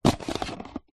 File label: technology vr headset phone tray holder slide out